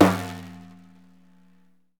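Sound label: bendir slap; Deff Bendir &Req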